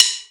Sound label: Drum Stick